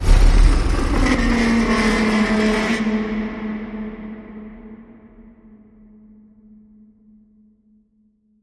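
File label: Creature Monster Cries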